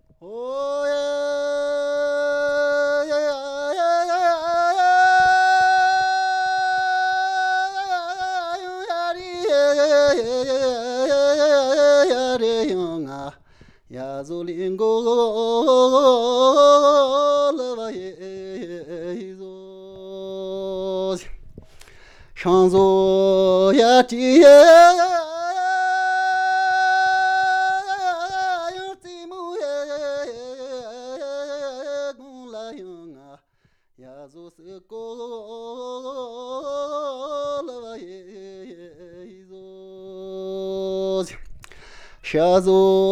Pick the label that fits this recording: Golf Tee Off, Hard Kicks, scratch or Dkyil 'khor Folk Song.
Dkyil 'khor Folk Song